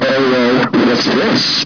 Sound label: pain, military dog